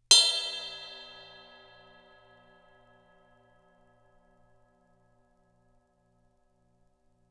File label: Ride dome; cymbales